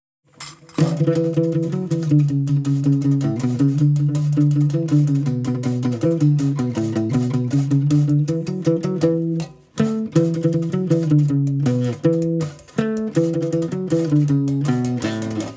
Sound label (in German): Karaoke Lasst uns froh und munter sein